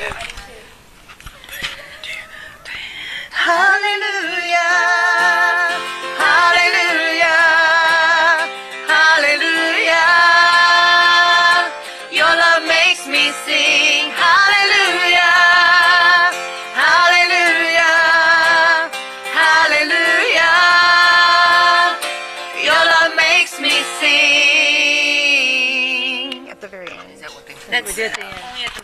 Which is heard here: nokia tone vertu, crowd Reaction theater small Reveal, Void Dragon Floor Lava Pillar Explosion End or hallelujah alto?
hallelujah alto